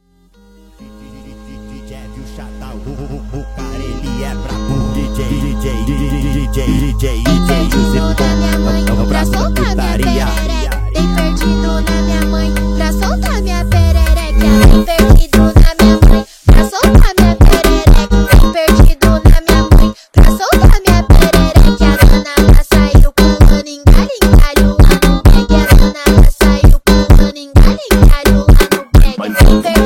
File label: Funk